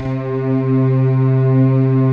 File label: PLUCKER KEYS, pads; Pro-Rec - Synthsonic; TR PADS